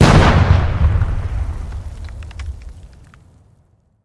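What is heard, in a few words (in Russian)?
взрыв фугасной бомбы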